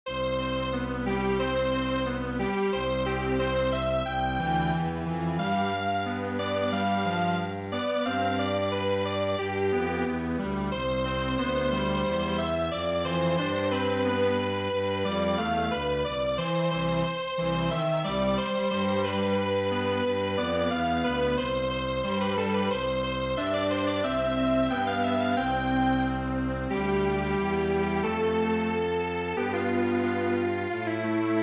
Strings Quartet; Eine kleine Nachtmusik

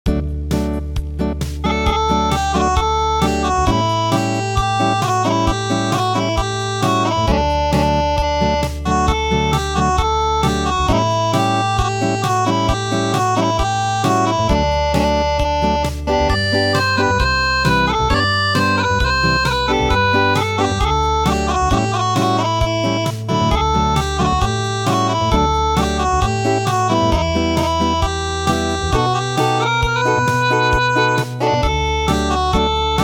Acoustic

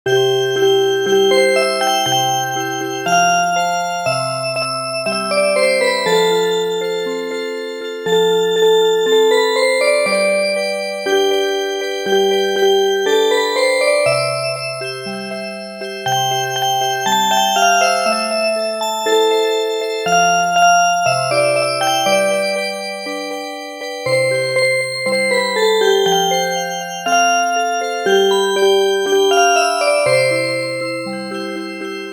Music Box, traditional; midi

